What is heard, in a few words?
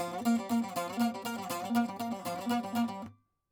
saz